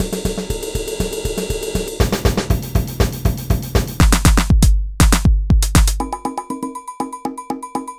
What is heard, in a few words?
Groove Pizza 120bpm